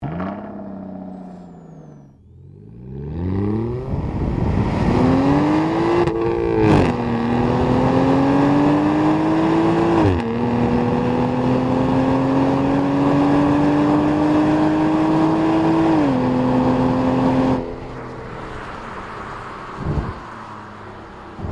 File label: Aston Martin DB9 Volante